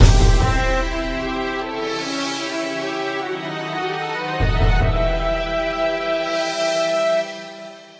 Boss Dies; Gryphon Knight Epic Definitive Edition

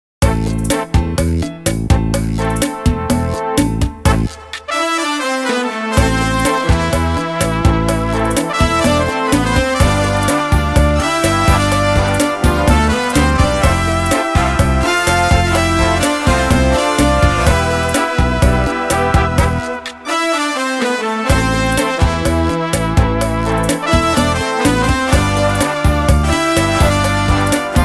Jukebox Cha Cha Cha; olympiad-cha-cha-cha; Deep Rock Galactic

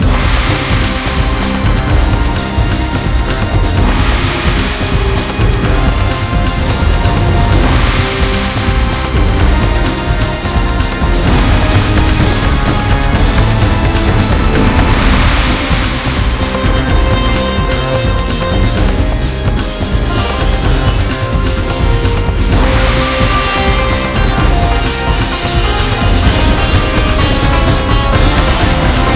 Battle Loop; Valkyrie Connect

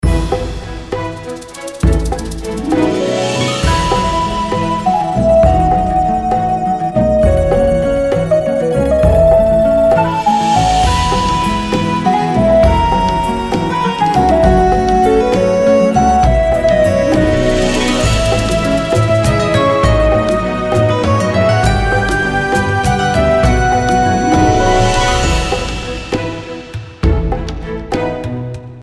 Bgm Plain Field

